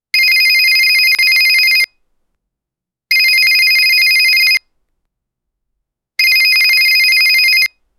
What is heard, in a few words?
an annoying cell phone ri